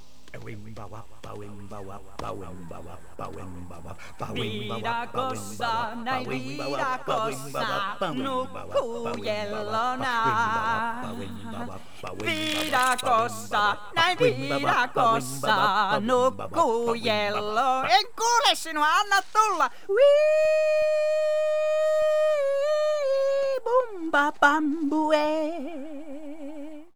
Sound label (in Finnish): Viidakossa